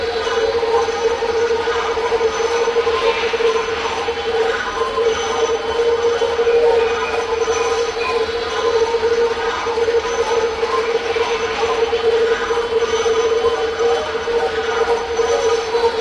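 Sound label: sphere rail travel loop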